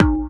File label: THROAT; Spectrasonics; Metamorphosis; THROATERS